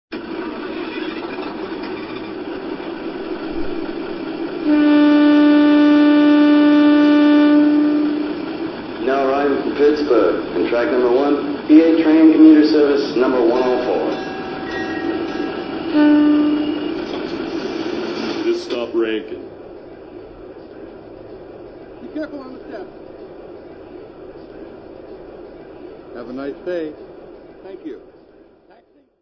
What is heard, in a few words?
RDC BUDD